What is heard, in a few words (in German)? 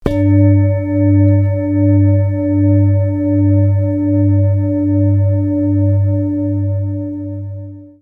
Klangschale; Sonnentag; Saturn; Liefeld